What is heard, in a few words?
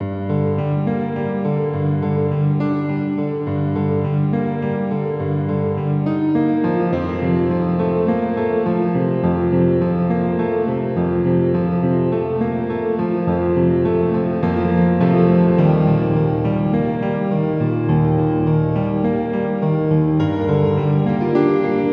Piano